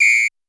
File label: Roland U220 Dry Set